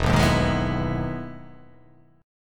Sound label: E Major 7th E down